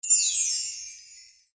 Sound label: jewels sparkle; Quick Play Jewels